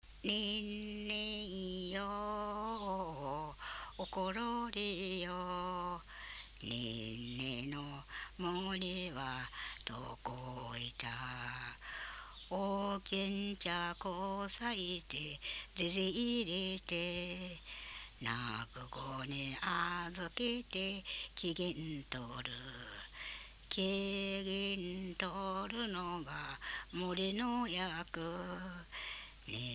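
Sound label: lullaby; minyou; komoriuta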